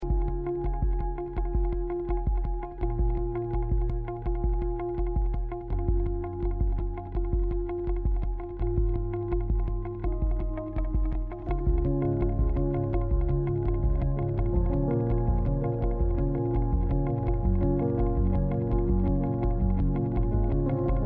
bus music; Life is Strange 2; gamesoundopedia

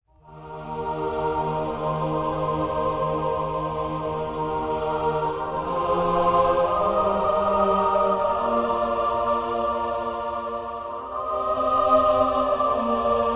music temple; baldursgate

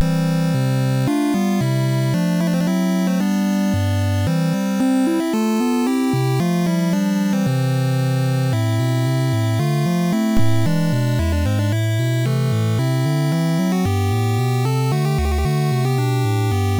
germany music; 8 eyes

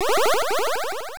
invincibility cross; castlevania

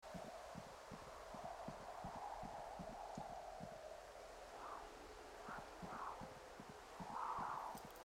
player walking stone; firewatch

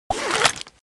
change sub menu customization; Subway Surfers

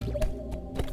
celeste sound climbing

